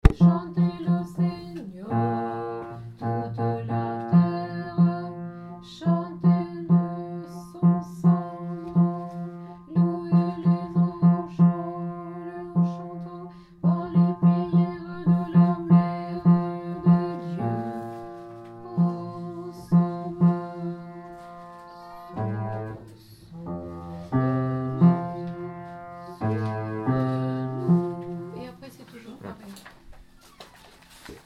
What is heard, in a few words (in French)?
antienne basse; Paque Antienne1